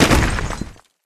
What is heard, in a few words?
taunt jackhammer down strike; video games; source engine; tf